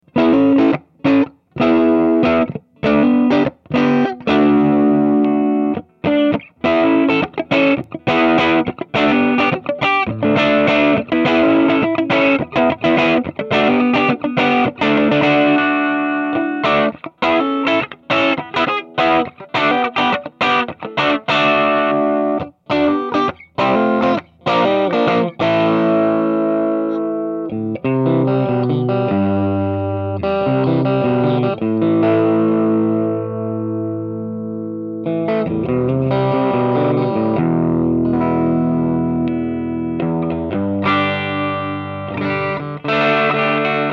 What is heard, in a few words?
strat raw, amps; Volume at 3 o'clock; gypsy